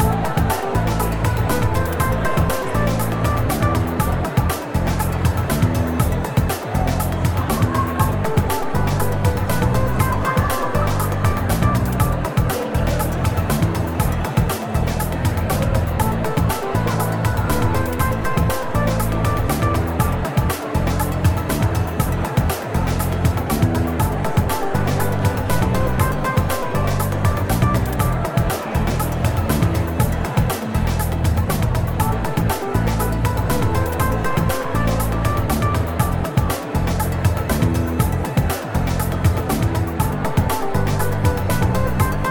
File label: prom chatter loop; SnootGame